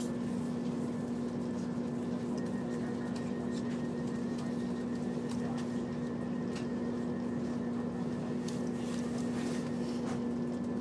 ambience train journey

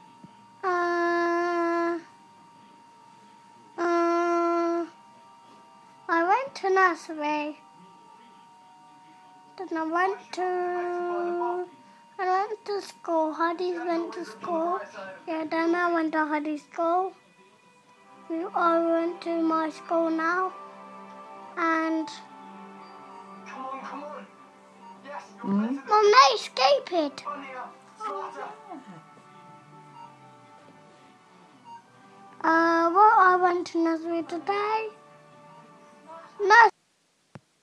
hmmm

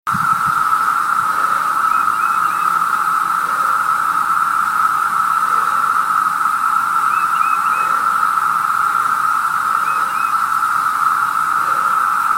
periodical cicadas brood II amplified

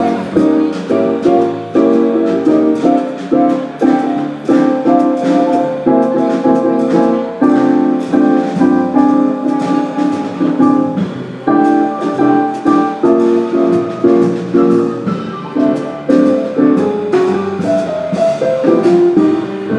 jazz

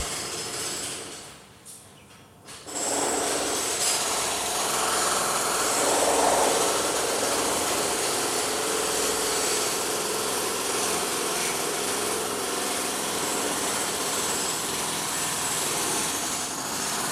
building noise

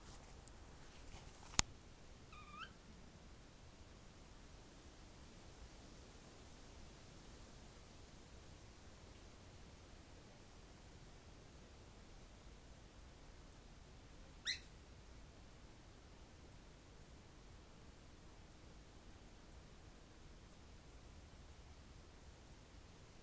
very peaceful garden

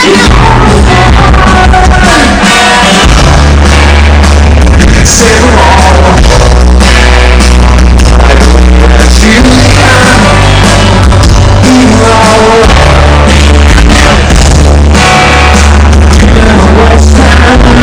quite a loud biffy

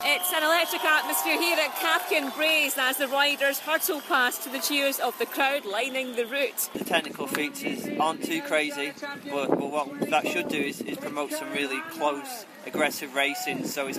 x country mountain bike finals; at glasgow 2014

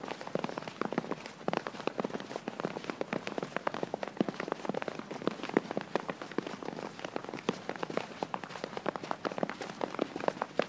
snow

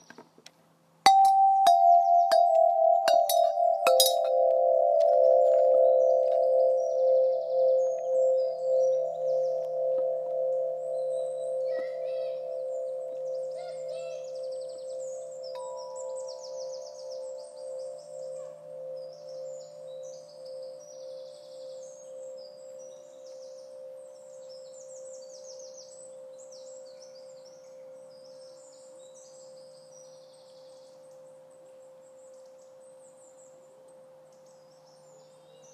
chimes recorded in a local park

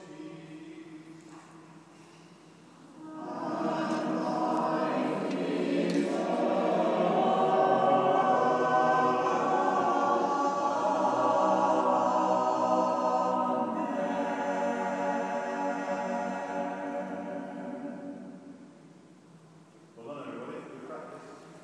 choir tuning up in derby cathedral; early 16th century wooden effigy pictured in foreground

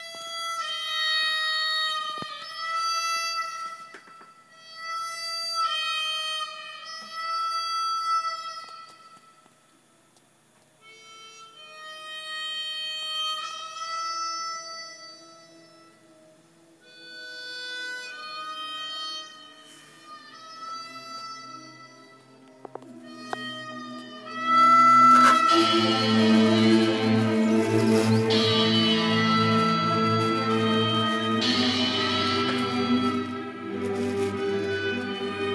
harmonica man

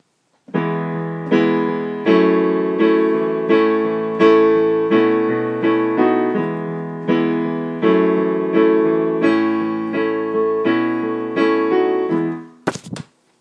piano

